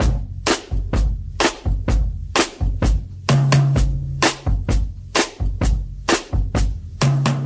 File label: DRUM LOOPS and BREAKS, 128 bpm; the shadows rise and fall fligel bunt